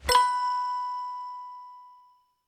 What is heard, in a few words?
win Simple